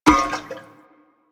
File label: Oil Grenade Bounce; Warframe; Vehicles; NechroMech; Abilities